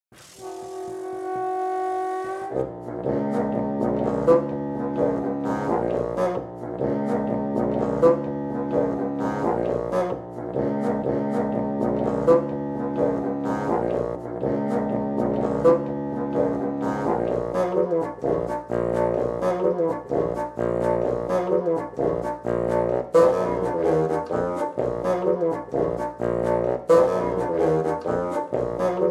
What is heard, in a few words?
in between 4 bassoons